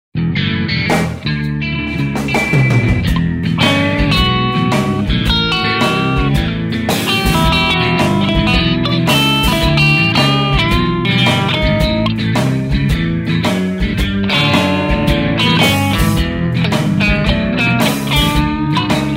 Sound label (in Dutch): blues